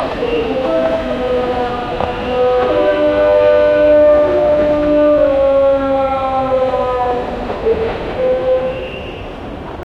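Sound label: chennai central railway station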